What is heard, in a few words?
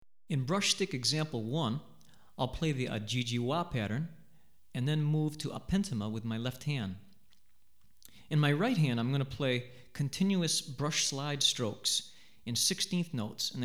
Stick Brush, West African Rhythms For Drumset; Sikyi; Hartigan